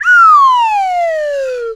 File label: whistle with sliding tone, Cartoon Film Effects